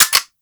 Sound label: UMP Mech, Weapons